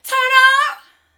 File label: VOC, Disco Fever VOCALS